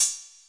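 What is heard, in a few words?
Roland CR-8000 cymbal; DRUM MACHINES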